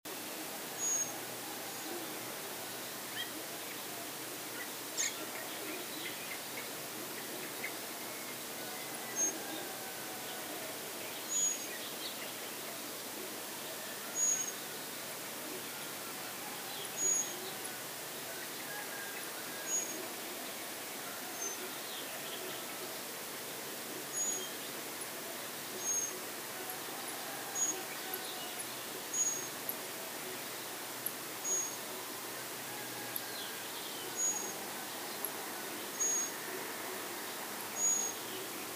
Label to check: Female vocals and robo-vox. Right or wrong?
Wrong